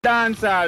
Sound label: dancehall music